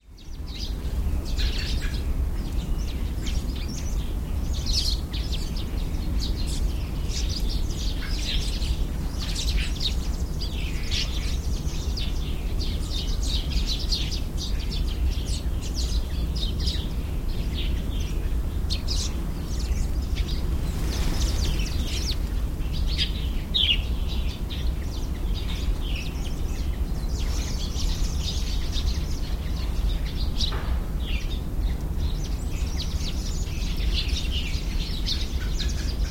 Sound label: birdsong in Beihai park Beijing